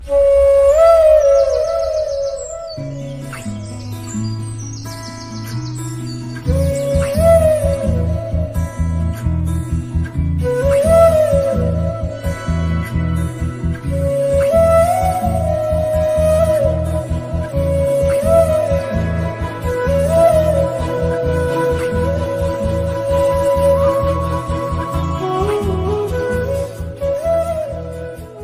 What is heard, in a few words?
Song Bgm Ringtone